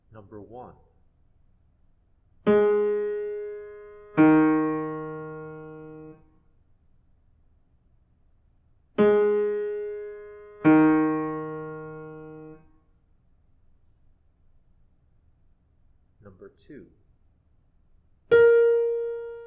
intervals

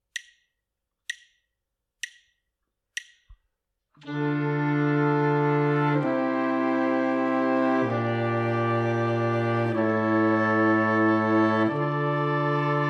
harmonic dictation

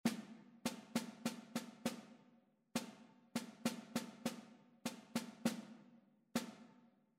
rhythmic error detection

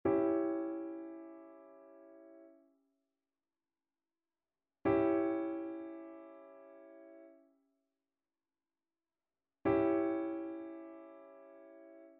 trichord; trichord identification

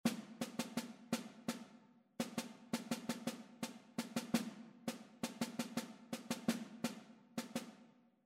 rhythmic error detection

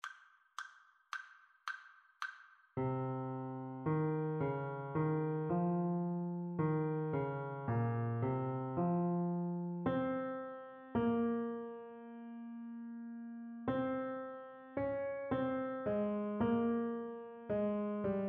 melodic dictation; eartraining

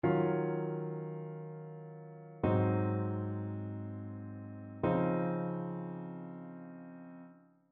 ii V I progressions; ear training

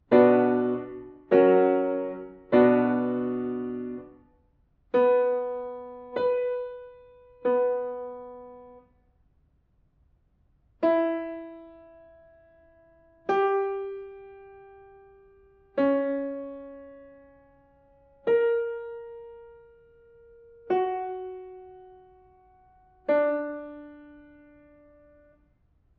diatonic collections

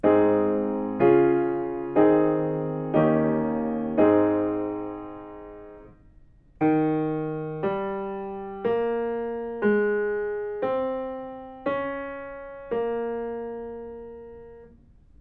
melodic fragments; ear training

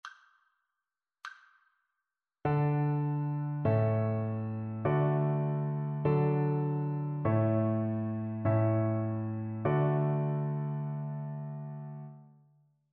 harmonic dictation

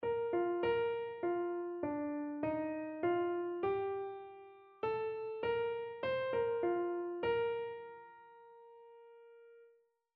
melodic error detection; ear training

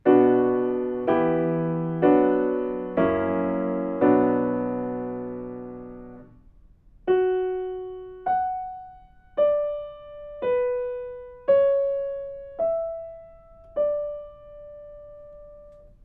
melodic fragments

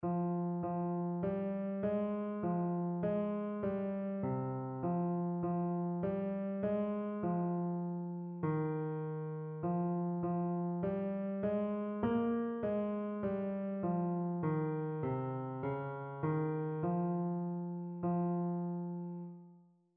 mode identification

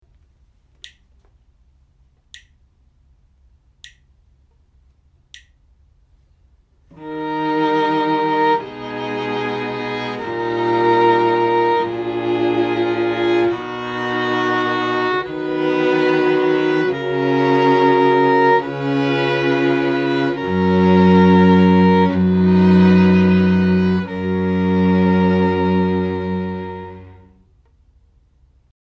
harmonic dictation; eartraining

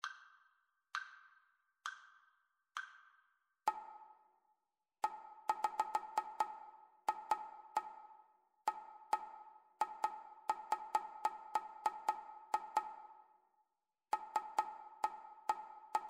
rhythmic dictation

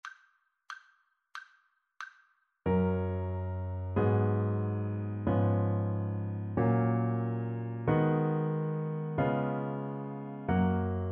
harmonic dictation

